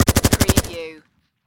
Machine Gun Heckler And Koch G36 Long Burst Close Perspective